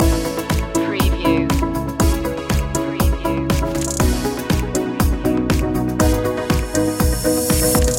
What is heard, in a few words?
musical notes loop; media music now